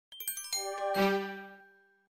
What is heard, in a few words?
New SMS Ringtone; ringtones